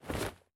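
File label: sounds of parachute jump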